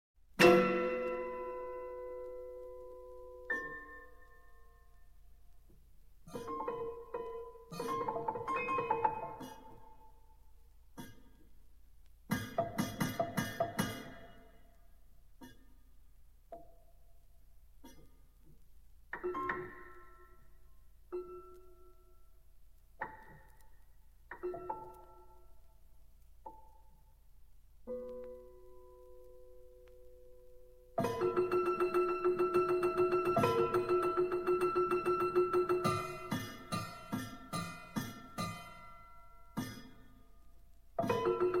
Amores For Prepared Piano and Percussion Solo For Prepared Piano